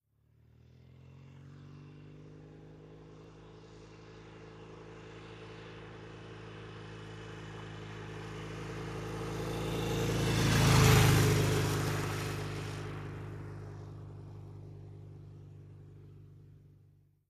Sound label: Motorcycle, Norton, Accelerates In, By ( 35 Mph ).